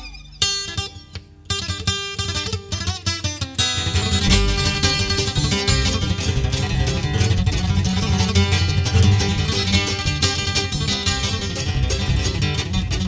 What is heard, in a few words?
Instrumental; Bluegrass